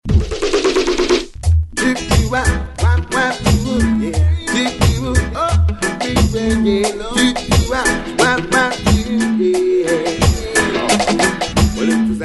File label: SAX